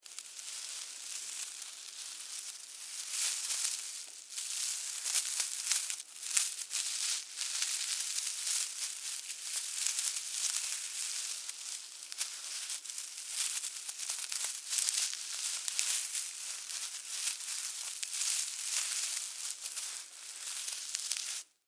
Animal Critter Movement Grass Foliage; Miscellaneous Animal

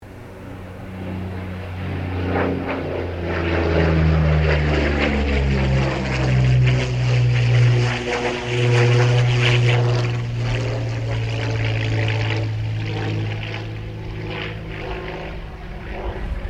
AIRCRAFT P-40 KITTYHAWK BARREL ROLL STEREO; P-40 Kittyhawk / Warhawk / Tomahawk; Airplanes; Vehicles

